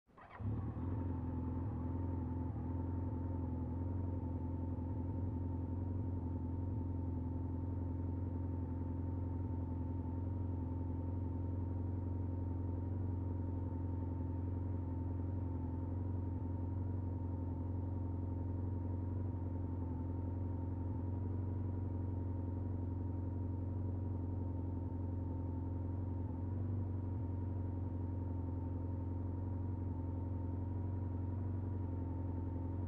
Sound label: Motorcycle starts, waits, turns off